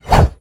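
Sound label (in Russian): промах при бое на мечах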